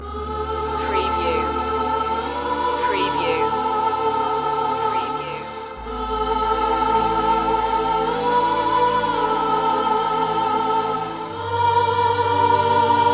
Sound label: chorus